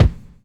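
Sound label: bass drum 70s, drum kit; E-Lab; X Static Goldmine 2; akai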